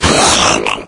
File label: pain, maggot; doom3